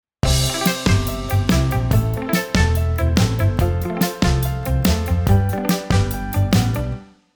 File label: guitar pattern